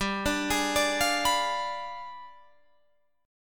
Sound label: G 7th Flat 9th G, Guitar Drop D; 17,17,18,19,18,19; down